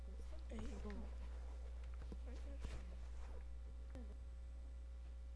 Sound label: laugh